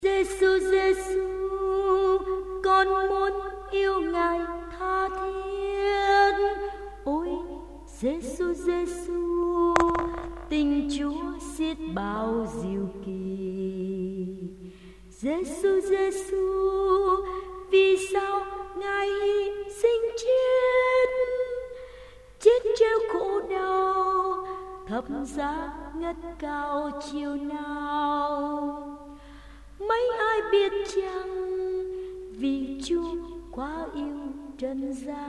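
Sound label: soprano alto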